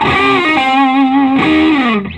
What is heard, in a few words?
SOLO, Vintage Blues Guitar; USB Soundscan vol.22; AKAI